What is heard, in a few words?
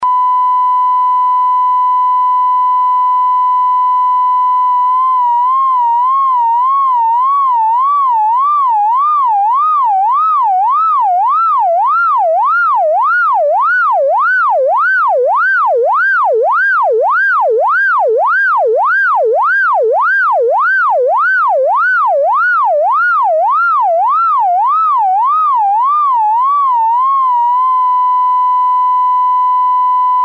Varying Frequency Excursion